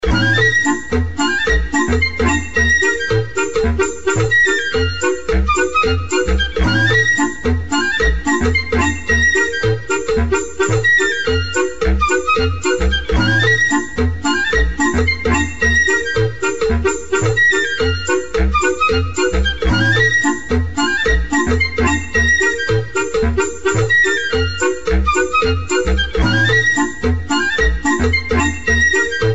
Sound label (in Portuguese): trilha de fundo